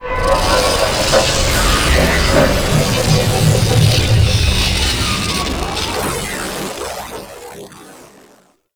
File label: Despawn; Enemies; Abilities; Warframe; Teralyst